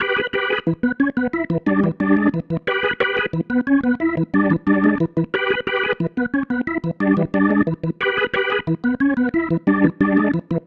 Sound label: Pattern, Organ